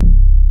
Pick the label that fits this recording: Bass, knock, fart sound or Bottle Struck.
Bass